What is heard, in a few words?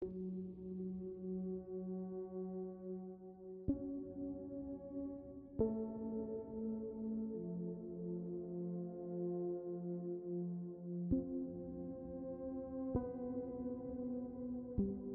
ambient